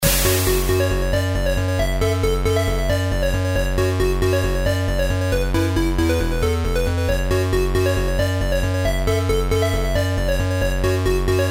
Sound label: techno loop NES